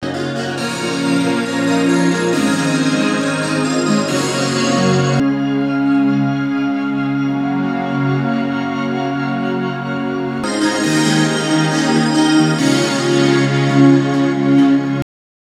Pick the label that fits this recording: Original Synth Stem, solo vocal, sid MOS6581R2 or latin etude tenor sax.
Original Synth Stem